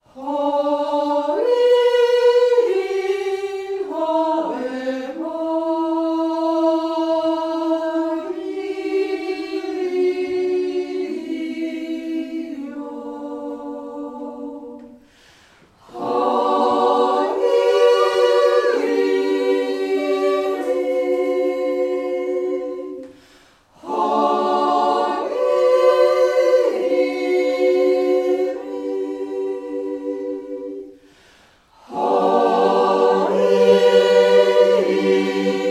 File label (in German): langenwanger jodler; schloss jedenspeigen herbst 2012